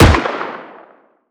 mid, shot rocket; ACE3; Arma3; CookoffSounds

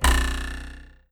arrow miss

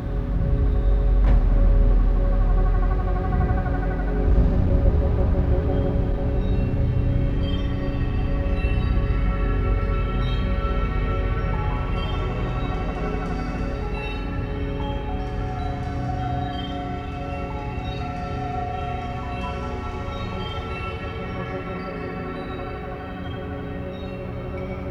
music space; omega

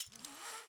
Rappel Start; AdvancedRappelling

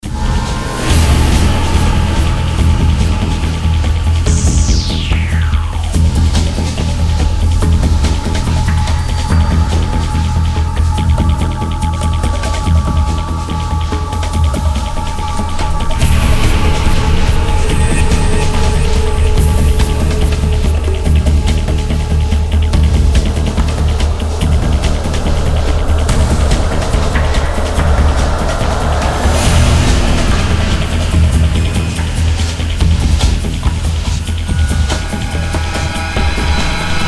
music danger; freelancer-hd-edition

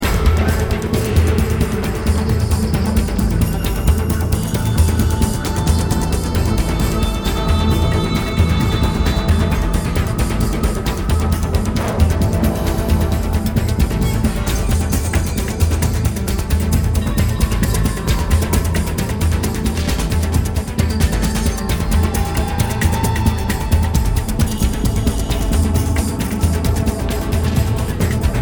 music battle; freelancer-hd-edition; tau

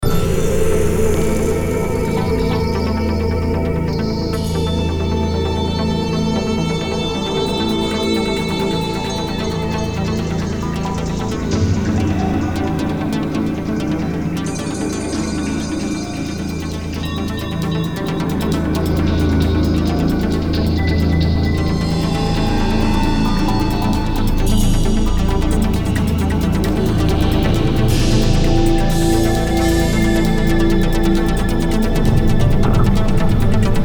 music danger; freelancer-hd-edition; tau

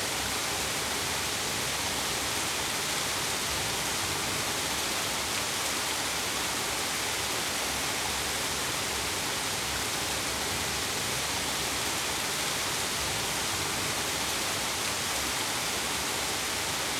rain, ambience

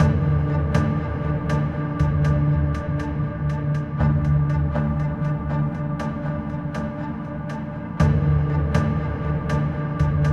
music anticipation motiv; freelancer-hd-edition

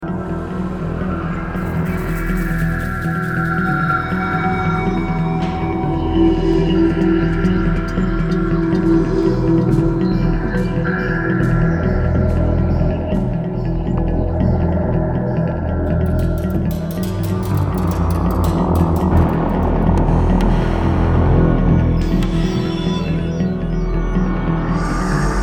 music danger; freelancer-hd-edition; omicron

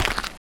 step dirt, footsteps

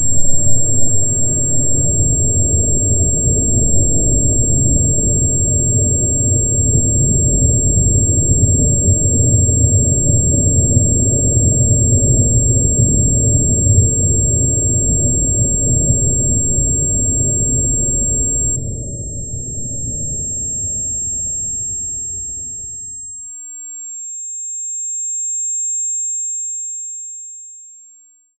muzzle blast ring; ACE3; Arma3; ear ringing

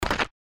step snow, footsteps; veloren

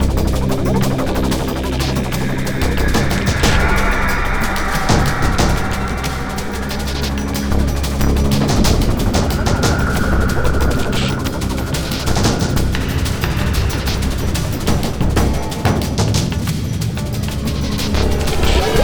music battle; freelancer-hd-edition

